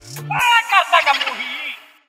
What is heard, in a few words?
Sms Tone